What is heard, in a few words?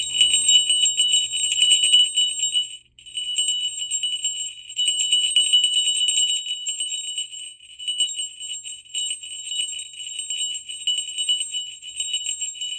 bell